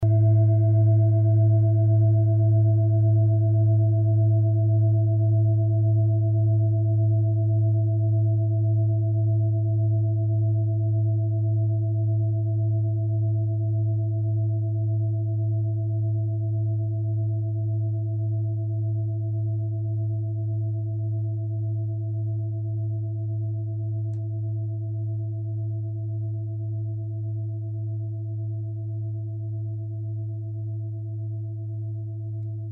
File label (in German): klangschale tibet; Planetentonschale: Limbus